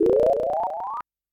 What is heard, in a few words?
warn; SM64 CO-OP; Junio Sonic